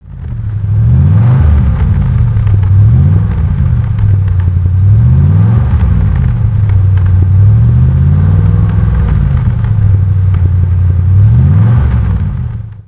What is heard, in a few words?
bristol 411 mk5